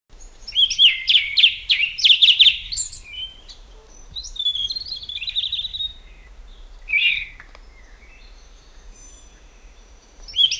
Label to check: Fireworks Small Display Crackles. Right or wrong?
Wrong